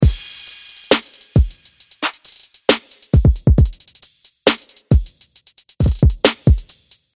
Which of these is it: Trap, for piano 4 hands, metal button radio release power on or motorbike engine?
Trap